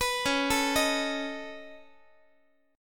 C sharp 7th Suspended 2nd Sharp 5th C sharp down Guitar; C#7sus2#5; arpeggiated; JGuitar